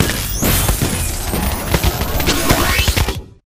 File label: Reaper Nuke Destruct Warmup; Titanfall 2